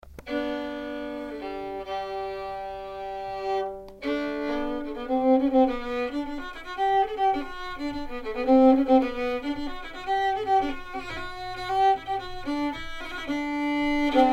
Stämma; Isak Anders

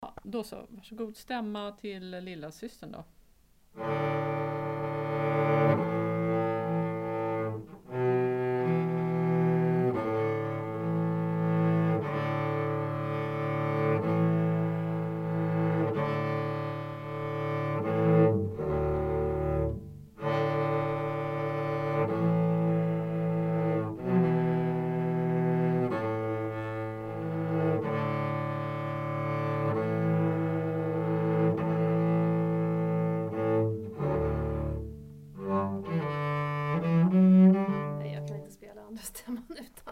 CEllostämma komp

